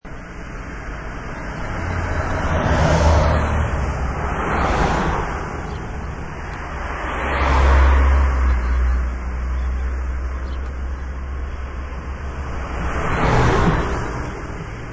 Cars Drive By, Car sounds; Traffic and Cars

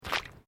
Footsteps Boots Asphelt Puddles Step; Footstep Foley; Footsteps (Sound Effects:Human)

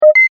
multimedia rollover, Multimedia Rollover Tone

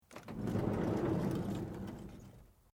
Car van old door sliding open slow

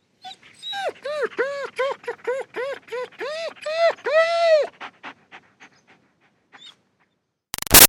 Dog German Shepherd Whine Rhythmic; Dogs; Animals

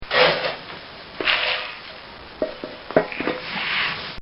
Scooping Grain

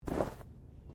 Body movement Slide; Body movement foley to simulate falls and deaths; Bodies; Human

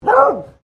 Animal Dog Beagle Female Bark Close; Various domestic dogs; Animals

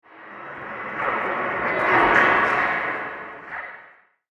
Ambient And Industrial Reverb; Scary Soundscape Sound Effects; horror sound effect

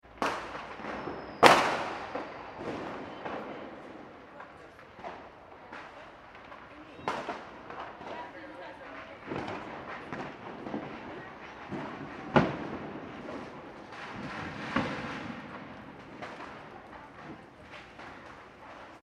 fireworks street urban; Fireworks ( Domestic ) Being Let Of In City / Town Centre; Chinese New Year; McKinney Sound

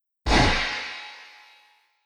Metal Explosive Impact; Explosive Impact 06; Miscellaneous Impact Sound Effects; Andrew Fleming

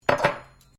cutlery general place bench, Household cutlery

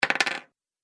Game Piece Place Then Fall; Thud Sound Effects

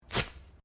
Clothing Velcro Rip Short; Movemement sounds for various articles of clothing; Ripping Sound Effects

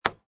Ping Pong Table Tennis Ball Bounce on Table; Sports; Lynne Music

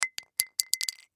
Plastic Drop Bounce; Plastic Tube Drop; Foley; Blastwave

